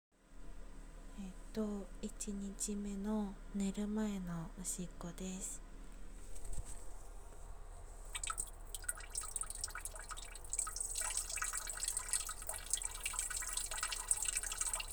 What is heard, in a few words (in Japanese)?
トイレでオシッコ